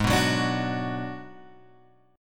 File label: Ab Minor 7th Flat 5th Ab, down, Guitar, Open G